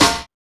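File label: HSE-SD, house snare drum; X-Static Goldmine 1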